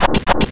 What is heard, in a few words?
climb; zelda; link